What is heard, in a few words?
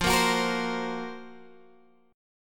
F sharp Major 7th Flat 5th F